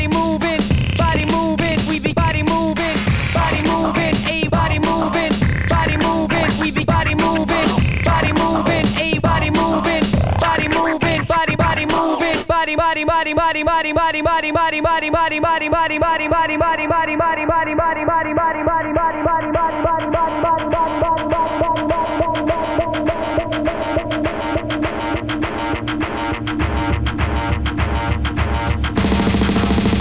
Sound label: hiphop